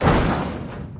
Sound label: garage open